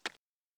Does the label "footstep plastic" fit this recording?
Yes